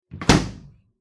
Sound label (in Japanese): 冷蔵庫の扉を閉める音